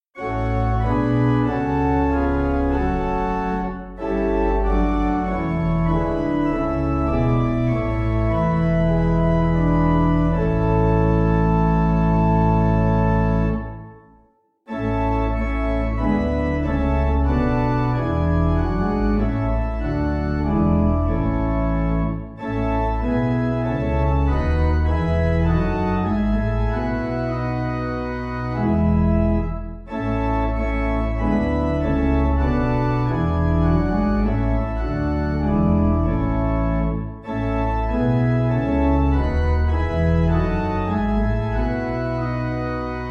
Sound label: Pipe, Pipe Organ; Lindeman